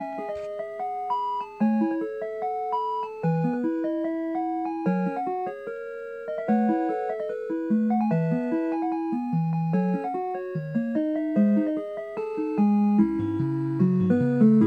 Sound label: 8bit; No Game No Life